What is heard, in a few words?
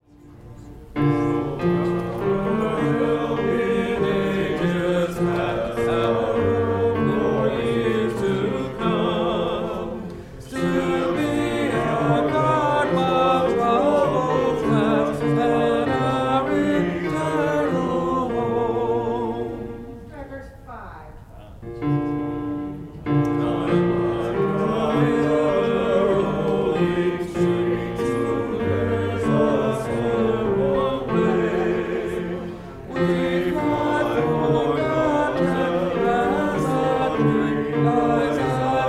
bass and tenor; O God Our Help in Ages Past; Gather Hymnal